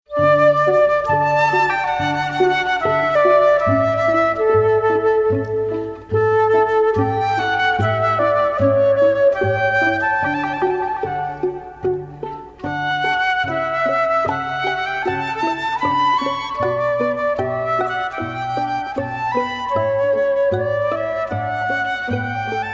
flute & strings; Vivaldi

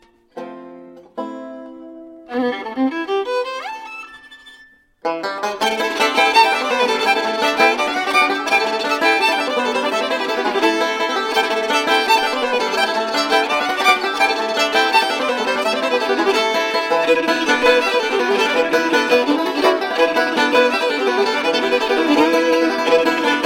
Irish Fiddle Irish Feat

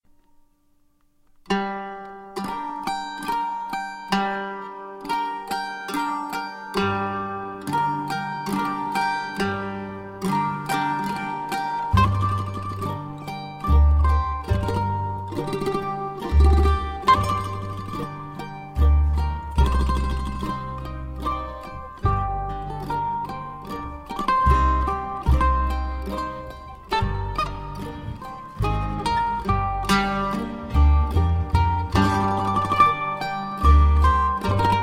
Klezmer Mandolyn Waltz

